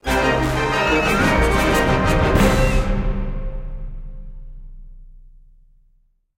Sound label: Action Sting; Jurassic Park The Game